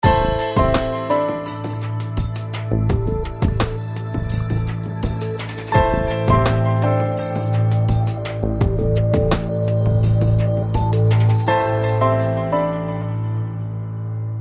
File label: loop